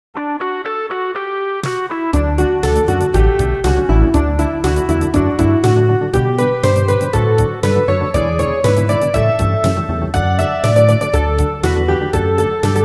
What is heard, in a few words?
jinglebell